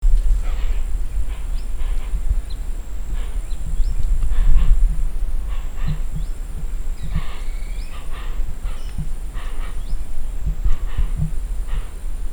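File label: Hoatzin, bird; گندمرغ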